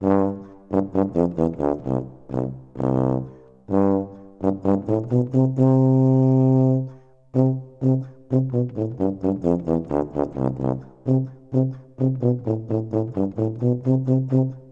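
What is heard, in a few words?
sounds of tuba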